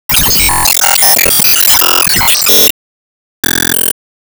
Start Transforme

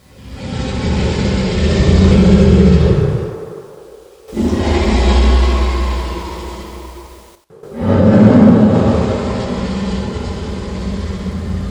Woods - Bear Sounds

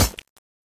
Impact Flesh

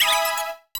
PLAY TRAMPOLIN

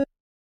Boss Footstep Stinger; Avie; Going Under

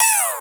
Uuuh

